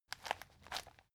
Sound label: plastic bag handle short